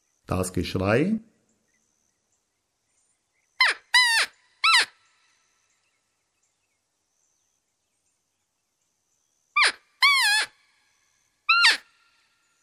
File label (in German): Geschrei